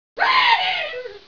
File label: scream